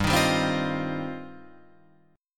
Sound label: G 7th Suspended 4th Sharp 5th, Guitar Open F